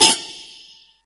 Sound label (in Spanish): utensilios